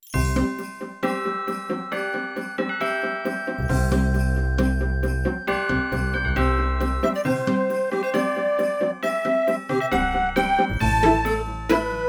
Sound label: new years fireworks music